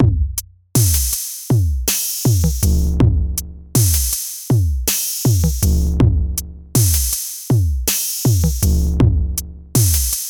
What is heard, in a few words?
Delay Drums Original; BIAS Pedal